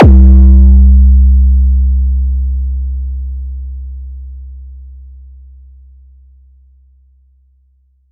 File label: boom, Kicks; ModelCycles